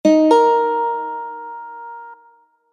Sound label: start sequence